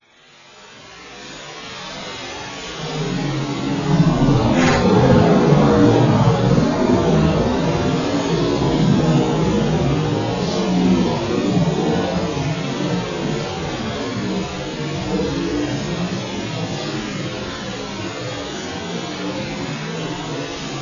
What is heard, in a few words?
rain; trad